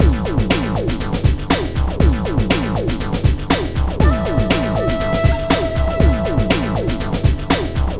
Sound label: club theme